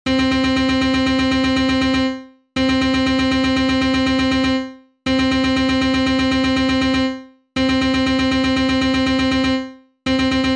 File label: articulation fluid